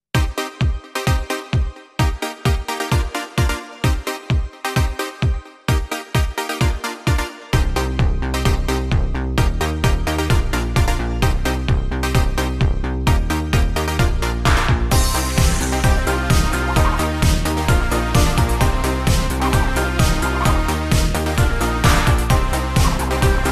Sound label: Italo dance mix